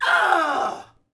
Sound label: Axmn Die Vox; Civ4 beyond sword